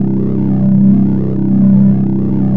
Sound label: SYN, 124 BPM, Drum & Bass; Ueberschall; Drum & Bass Flash Trackz